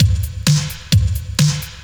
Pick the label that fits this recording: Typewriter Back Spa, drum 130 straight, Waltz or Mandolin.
drum 130 straight